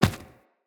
Infested Medium Run Default, Footsteps

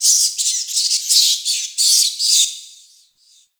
Forest Distant Medium Creature Small Cranky; Ambience; Warframe; Grineer

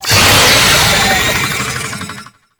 Boss Vocal Alerted; Enemies; Vocals; Warframe; Oraxia

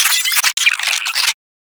space drone enemy spotted; Warframe; Corpus Space Drone

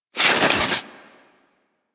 Armadillo Roll Snow; Warframe; Hunting Critters

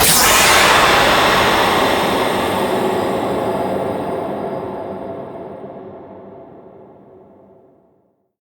Archwing Intro Depressurize; Warframe; Cinematics

